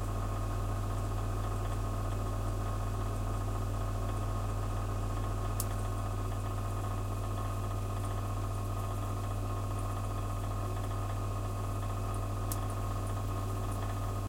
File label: gas heater; Golden Crayon Sounds